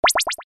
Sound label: Sound Run; gamerip; Dream Tower